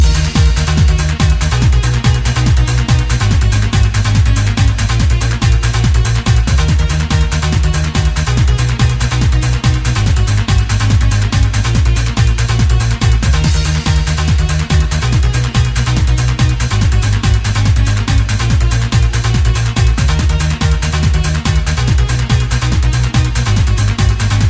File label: trance music